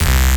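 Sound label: Rave Synth, Dance Mega Jungle Rave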